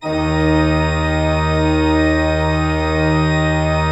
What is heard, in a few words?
Cathedral Organ; Propeller Island